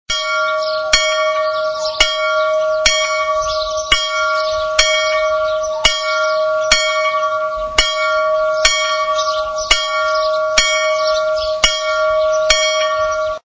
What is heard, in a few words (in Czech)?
zvonek; cernydub